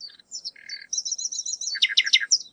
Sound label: bulbul; simscene-py